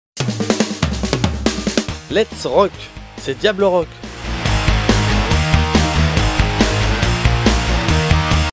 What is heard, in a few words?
jingle let s rock; diablorock